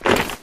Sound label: suit step, Footsteps